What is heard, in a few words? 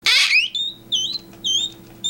My 3rd Highest Note